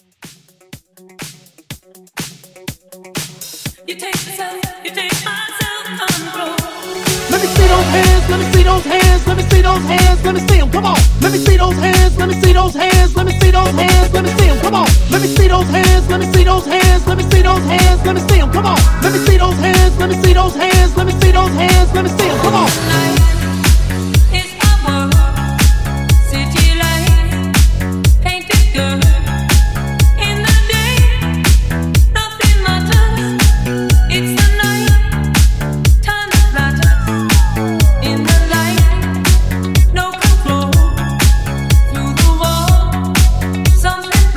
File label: Mixshow Hype 123